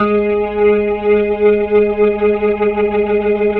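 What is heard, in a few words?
2G# L, Slow Fast Hammond, Organs; E-MU Producer Series Vol. 5 – 3-D Audio Collection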